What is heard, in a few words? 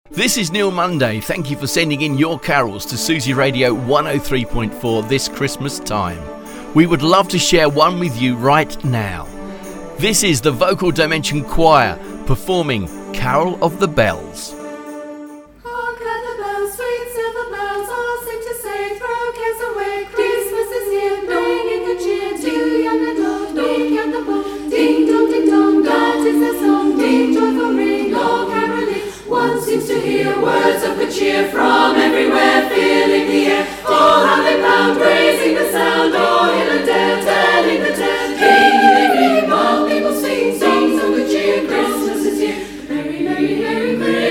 Choir Carol of the Bells